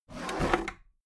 desk drawer open heavy

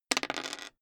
dice roll table hard

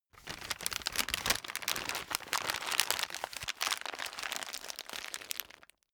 paper wrapping crumple

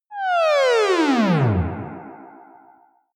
small ufo movements

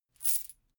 chain mail armor movement

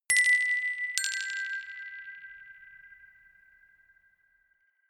notification glockenspiel rattle third down

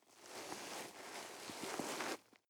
nylon leather soft

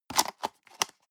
plastic box food small close hard

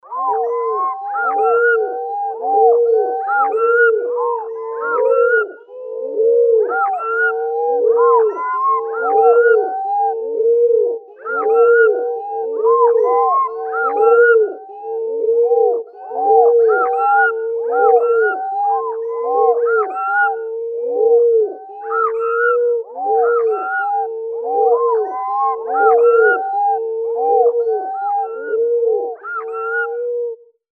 creature loop

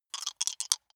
big two rattle in hand closed short

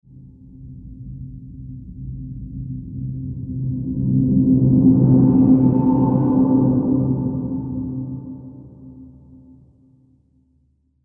tonal mystic riser

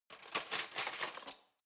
cutlery knives drawer grab

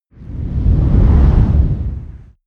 whoosh dark storm

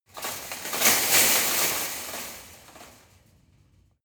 metal fence

